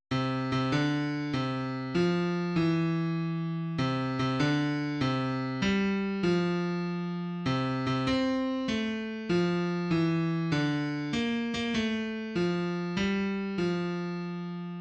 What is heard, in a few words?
monophonic playback